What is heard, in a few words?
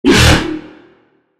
Wobble - Wao Wao; Routed Sound; Vital Expansion - Chaos Bringer Vol.1